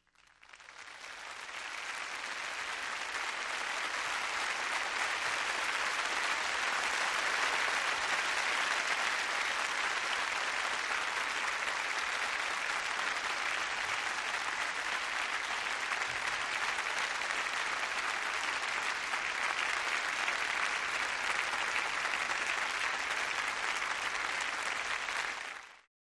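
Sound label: Crowd 20000 Begin, large interior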